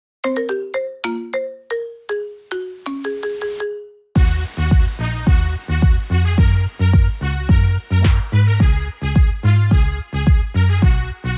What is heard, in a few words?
Marimba Remix Ringtone